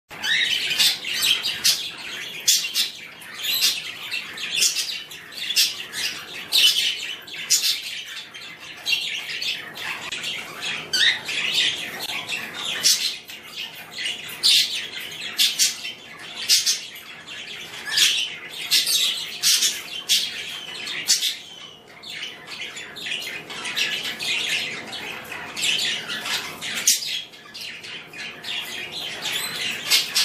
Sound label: english budgie singing